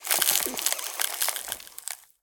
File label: frozen; Scrat